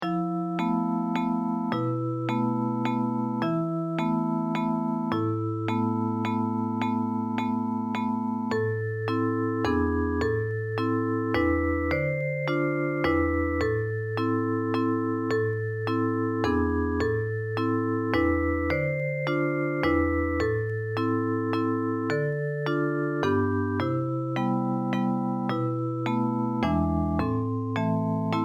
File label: simple waltz